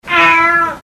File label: meow; cstrike; forlix